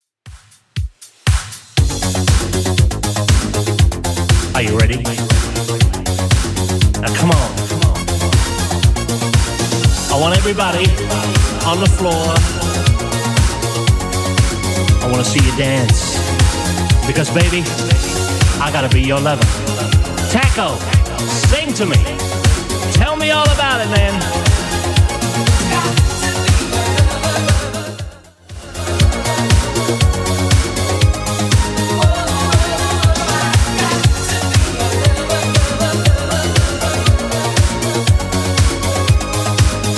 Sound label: 80s Redrum 119bpm